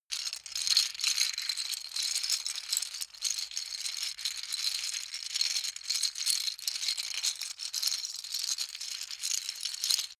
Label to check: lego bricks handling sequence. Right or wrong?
Right